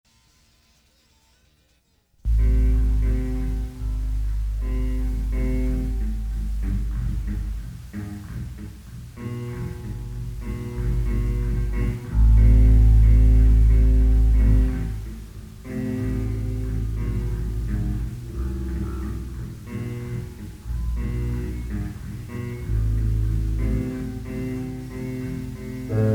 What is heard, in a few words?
punk rock